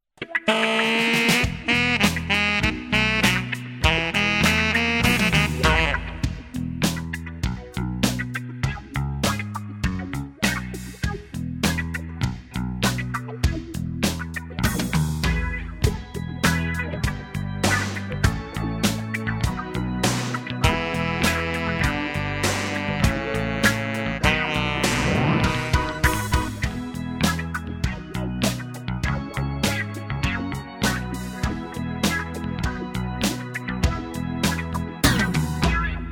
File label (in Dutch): opklaringen instrumentaal